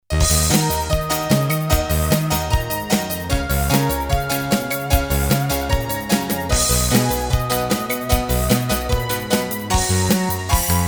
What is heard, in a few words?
MIDI GM by Viscount MF01; Delta - Thalamus (1987); Retrogames music Synth remake